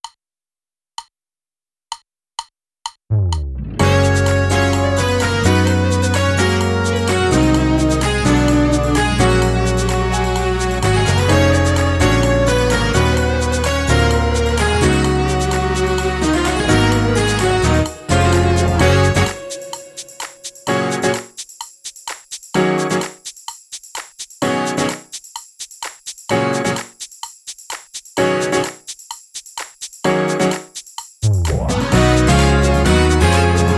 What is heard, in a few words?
CCM Gospel drumless